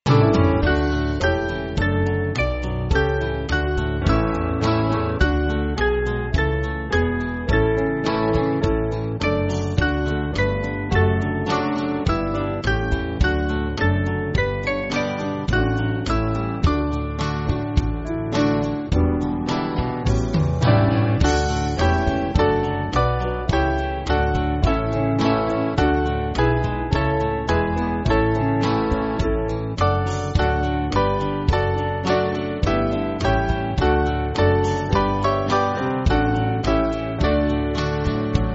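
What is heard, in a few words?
Band, Free Music Backing Files for Churches; Come Let Us Join; Stockton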